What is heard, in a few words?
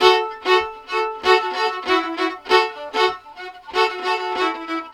VIOLIN, Balkanish Traditions; USB Soundscan vol.63; AKAI